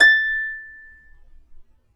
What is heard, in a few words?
A5 HARP; USB Soundscan vol.62; AKAI